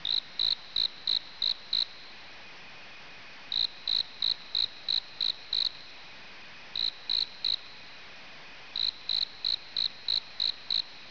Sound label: amb crickets